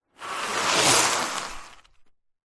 vehicles bicycle skid pass by fast road slightly wet; zapsplat